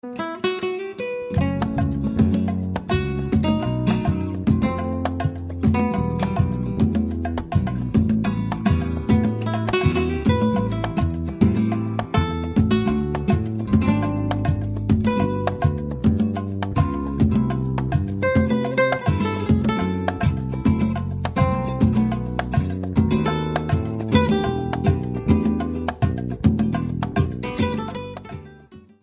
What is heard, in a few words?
guitar instr